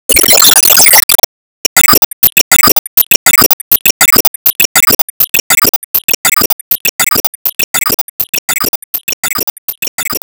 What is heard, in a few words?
Bless Loop; Disney Star Smash; Android